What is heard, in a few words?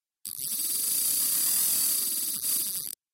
fishing reel